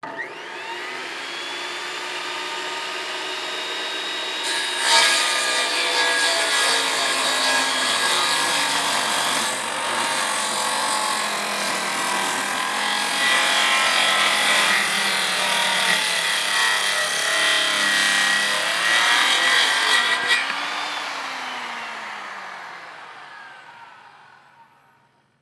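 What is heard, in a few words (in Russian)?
звук циркулярной пилы которая режет древесину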